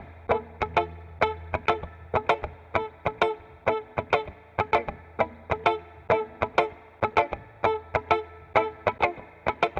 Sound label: Smooth Groove Guitar, 70s Analog Funk; Sonic Foundry (Sony Creative Software); What it Is!